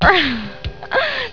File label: breathy female